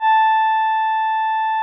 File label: VP-330, String Machines